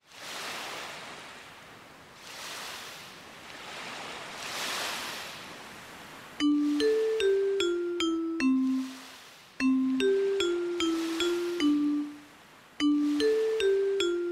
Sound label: River Jazz